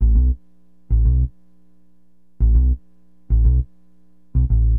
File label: BASS, Acid Jazz Funk